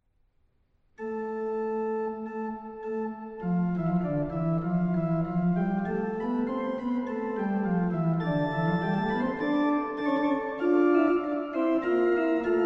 Fantasia a4 dorisch; Anthoni van Noordt

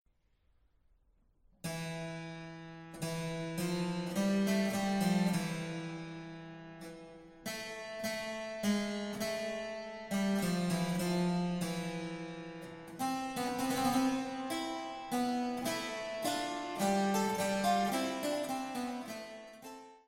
Fantasia a4 phrygisch; Anthoni van Noordt; Derde

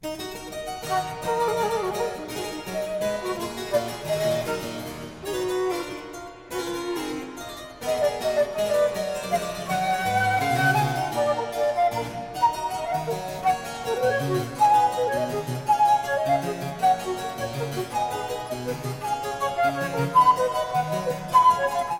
Flute Sonata in E Minor Gayement; No. 4; Op. 91

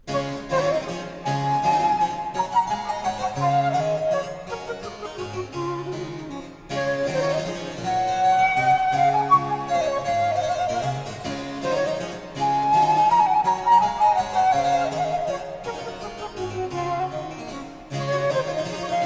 Flute Sonata in D Major Gayement

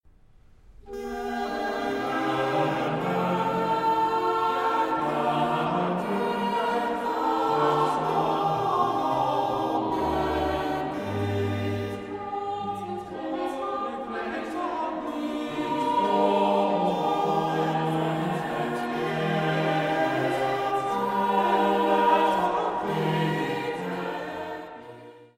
Koraalmotet; Psalm 100 1; “Juich aarde juicht alom den HEER”

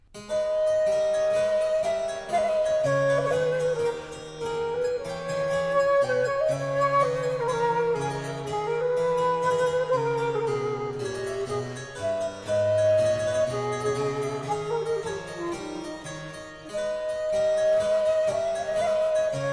Flute Sonata, Gracieusement; No. 5 in A Major; Op. 91

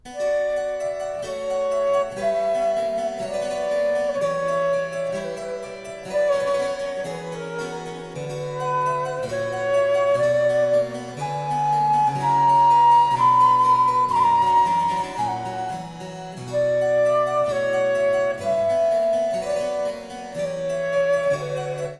Flute Sonata in C Minor Gracieusement

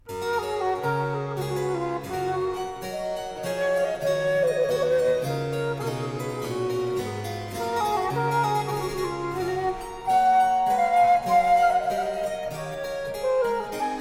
Flute Sonata in G Major Air Gracieusement; No. 3; Op. 91

